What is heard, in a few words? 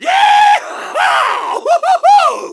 hoho; svencoop